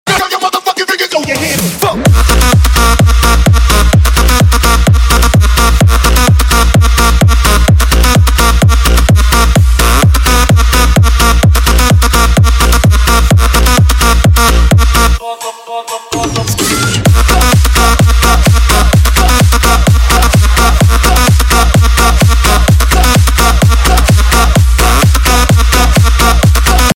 Electro house, ringtone

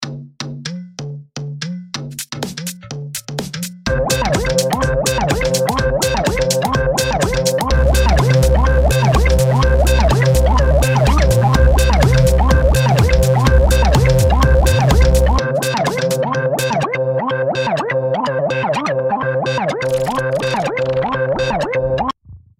DeepHouse

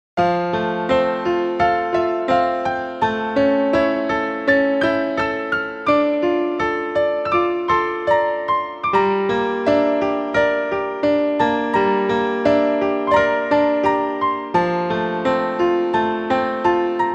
very very sad

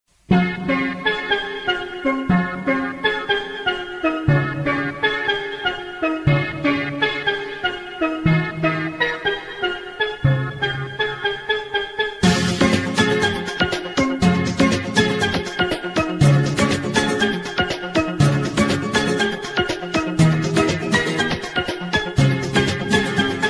Acoustic

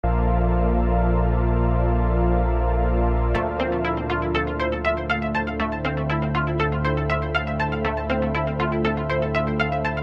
ring, electronic ringtones

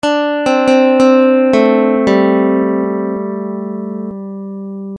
Nylon String Pluck

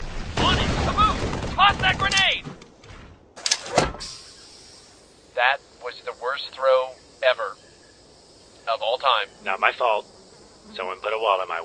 THE WORST FART EVER

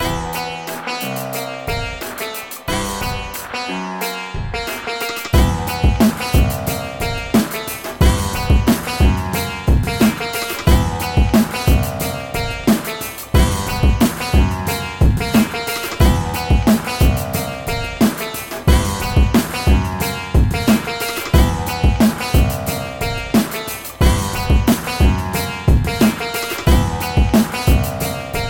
Beatz

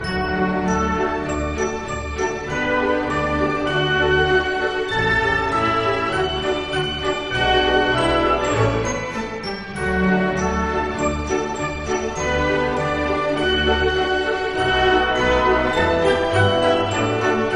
Polka

